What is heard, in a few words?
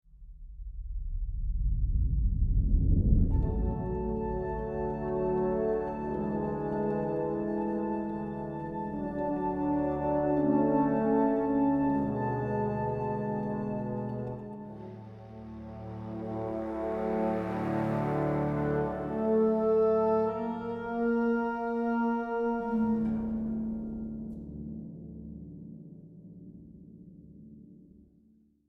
World Music